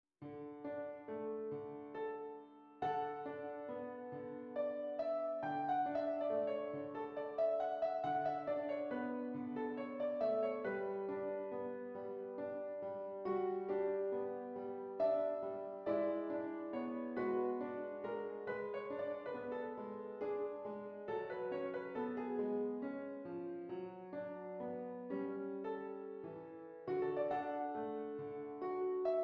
Prelude in D Major; Autumn Prelude No. 3; Autumn Preludes

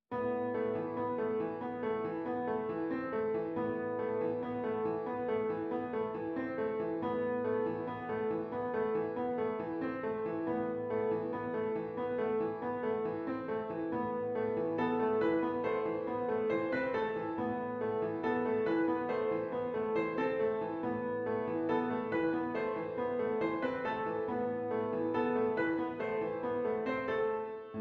Piano Solo

